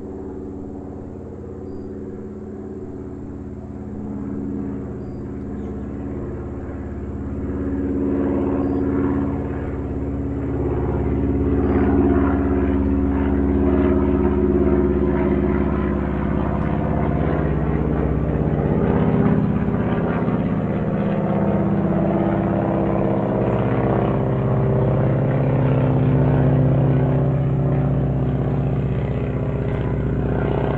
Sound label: Airplane Fly Over; Mike Koenig